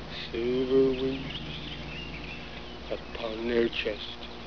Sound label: QUACK